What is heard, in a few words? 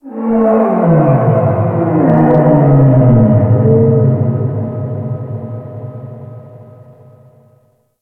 Ocean Whale Call; Warframe; Grineer Ocean